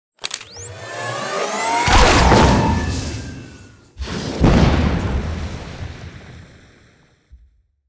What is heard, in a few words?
Rail Rifle; Warhammer 40000; Tabletop; munitorum Volume I Sound Effects